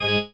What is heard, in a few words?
marimba grave; ゆめ2っき